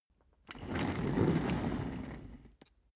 office chair trundle fast long

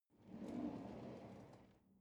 drawer cutlery draw out slow

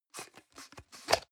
plastic can small lid screw open medium

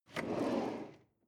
drawer medium open draw short

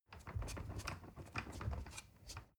ladder wood rattling long

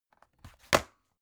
folder close hard

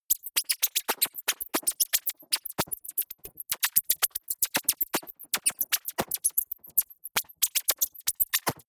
electronic bird chirp long

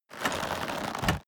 crossbow load

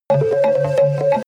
synthesized hectic bells short

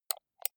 computer mouse right click slow

